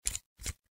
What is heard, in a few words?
Screwing